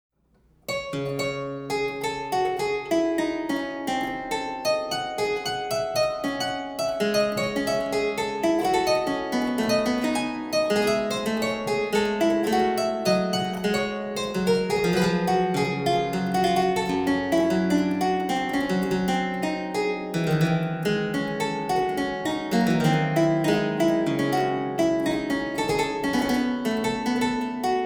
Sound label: French Suite No. 1 in D Minor, Allemande; Johann Sebastian Bach